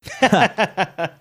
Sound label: short laugh